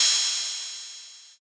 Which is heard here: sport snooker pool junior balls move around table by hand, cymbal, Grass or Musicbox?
cymbal